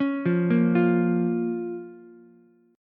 Bb Minor, Ukulele; 6,5,6,8; arpeggiated; chord shape

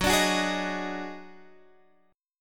Eb Minor Major 7th Double Flat 5th

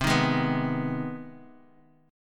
C Minor Major 7th Sharp 5th, chord; strummed; JGuitar

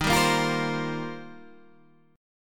D sharp 6th Add 9th D sharp Guitar Standard; strummed; JGuitar

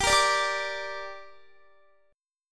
G Minor G down Guitar, chord; Gm strummed

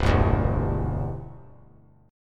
C Major Add 9th, chord; strummed

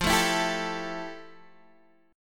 F Major 9th F, Guitar, Open D

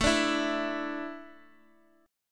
A Suspended 4th

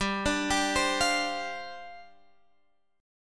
G 7th Suspended 4th G down Guitar Open G

